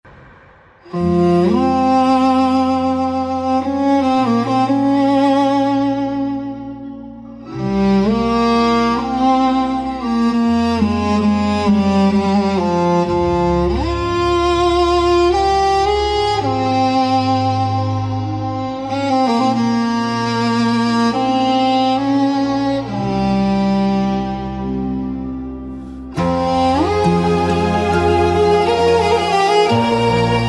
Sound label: violin